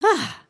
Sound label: bow